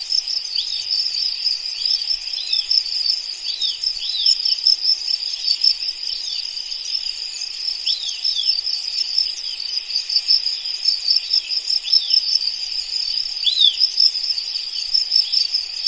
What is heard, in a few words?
crickets extended